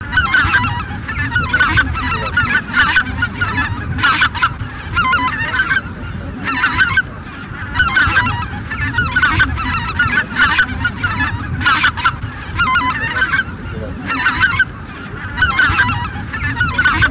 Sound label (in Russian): Белолобый базар Oche Lombardelle